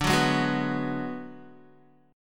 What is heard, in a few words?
D 6th Flat 5th, Guitar; D6b5 strummed; 9,9,8,11,11,x; JGuitar